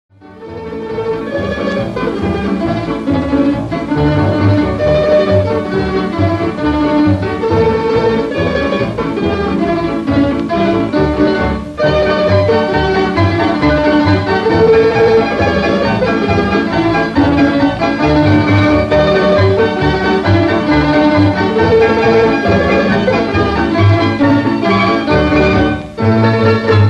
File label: March; Worker's Greeting; Arhoolie